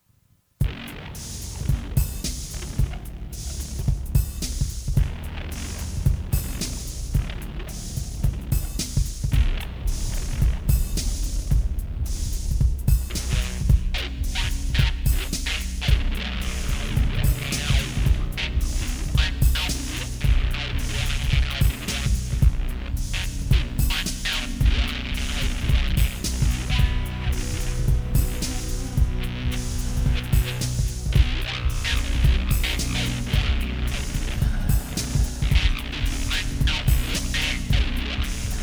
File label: Interlude